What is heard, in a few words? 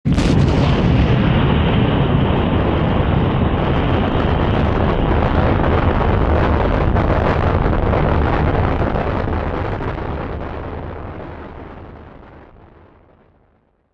lift off; starbuck